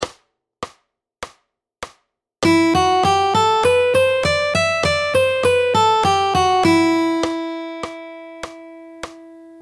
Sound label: Fingerstyle Scale; Fingerstyle Fun; P1 Getting Started